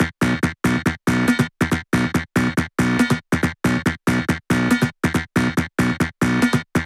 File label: 140BPM B; Vengeance - Essential Clubsounds Vol.4